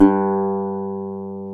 GTR, Dobros, National; Club-50 - Foundations Roland

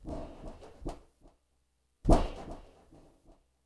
wobble; Spectrasonics; Liquid Grooves 1 & 2; ODDS + ENDS

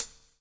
COPS STK; Spectrasonics - Backbeat

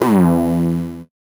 VocoBit Noise, 8-bit; musicradar; 8-bit bonanza

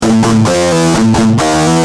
G Jam, Guitar; Industrial

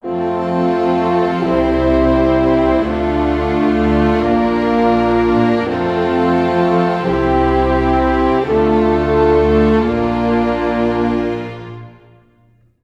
85 F maj full mix, Orchestral arrangements

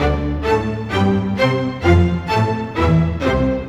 Strings; Sonic Foundry (Sony Creative Software); Orchestral Series VOL-4; Rock and Pop; Rock-Pop 15

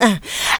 Vocals, Adlibs; Houseworx

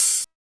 HH HH, Dance

